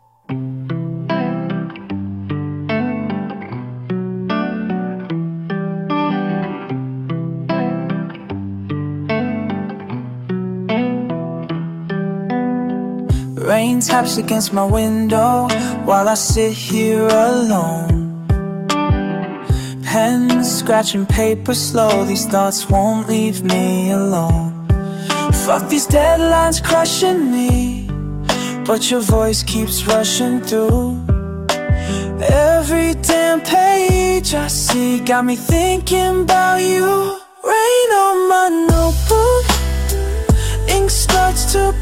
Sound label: RAIN DROPS